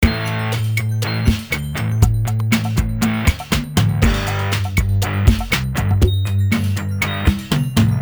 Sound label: depressing rock music